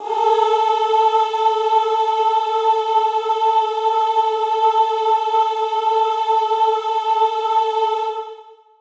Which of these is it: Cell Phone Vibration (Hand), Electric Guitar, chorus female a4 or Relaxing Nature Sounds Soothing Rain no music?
chorus female a4